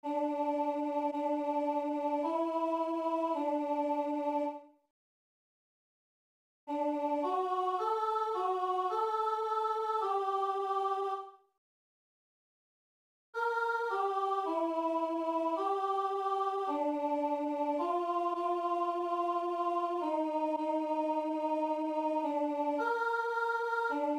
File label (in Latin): stabat mater alti